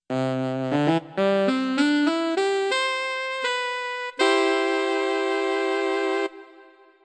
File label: Sax High; RoboTutor